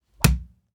Large Swede Stab With Whoosh

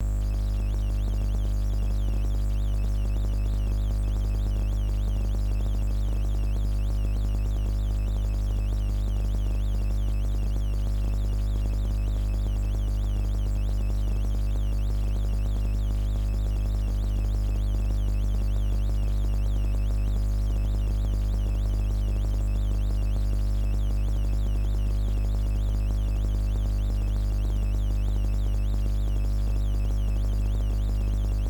Noise

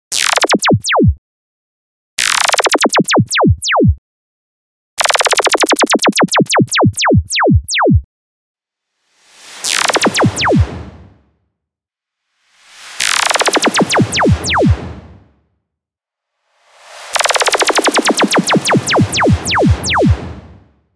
Chirpy Sparky Stinger

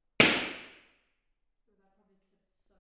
Balloon Popping

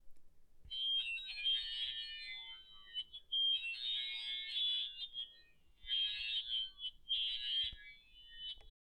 Distorting A Hearing Aid Alien Sounds

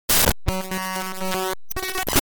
Glitch Noise

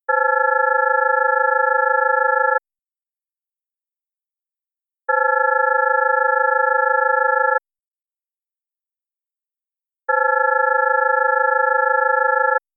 Telefono Pure Data

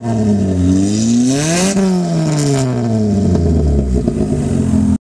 Sound Of Car

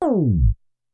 Tape Slow

